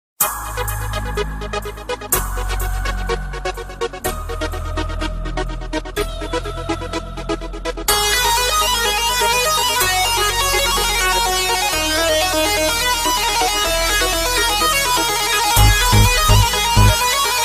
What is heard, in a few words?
Kurdish